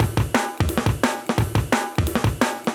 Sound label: DNB BREAK; m8 breaks